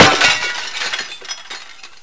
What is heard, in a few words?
glass